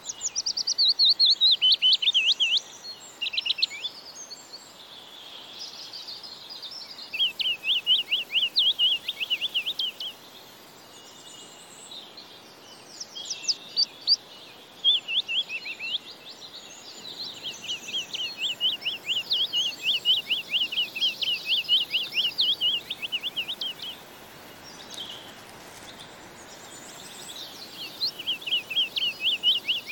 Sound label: Wood Warbler adult singing; RSPB